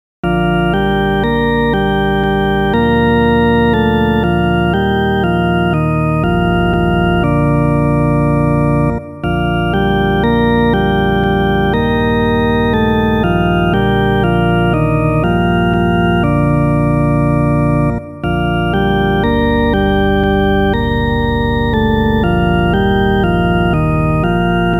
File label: solo organ kyrie; angelis